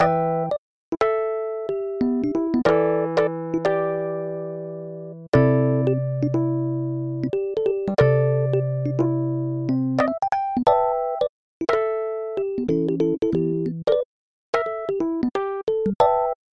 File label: Muffed Rhodes